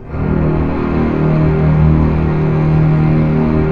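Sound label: Strings D#0 PP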